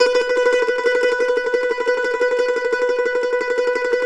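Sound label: mandolin tremolo B4; Sampleheads; Whole Lotta Country 1 & 2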